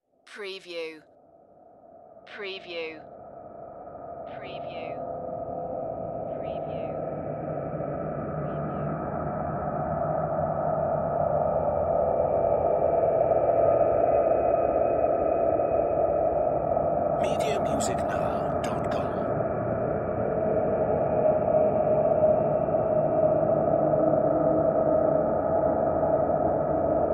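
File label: Production Element Bed Ghosts; Sound Effects Bible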